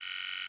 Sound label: beep denied, buttons; gesource